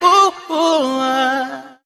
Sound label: hoo hoo ung SUNG; ooh ahh; ho hoo woo